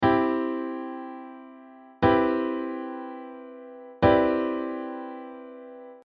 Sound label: Cmaj maj7 maj9